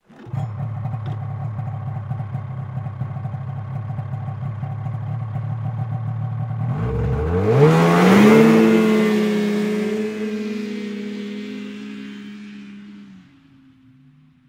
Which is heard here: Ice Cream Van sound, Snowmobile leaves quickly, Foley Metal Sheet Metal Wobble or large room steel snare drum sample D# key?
Snowmobile leaves quickly